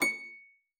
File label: C7, HARP PIANO; Xsample VOL-8